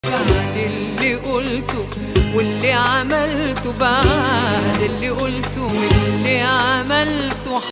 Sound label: Hijazkar Hijaz, maqam